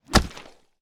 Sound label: GORE Splat Hit Deep; mono; GORE & SPLATS; Universal Sound FX